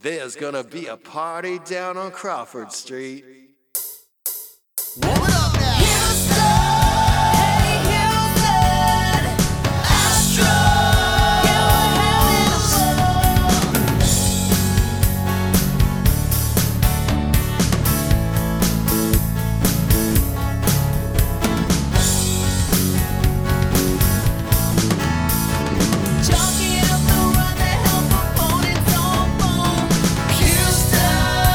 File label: acoustic karaoke